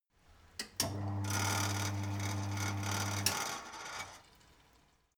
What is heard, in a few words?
mechanic sink running short